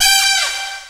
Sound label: HIP HOP; Rubber Chicken Software Sample Bank